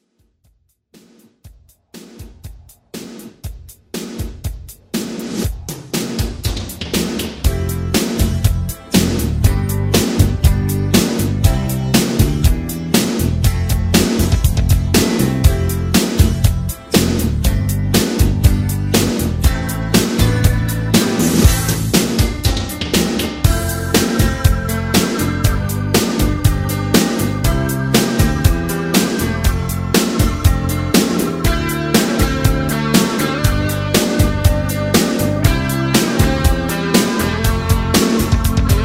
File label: Ghost Beat